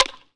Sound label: bat soft; hb6demo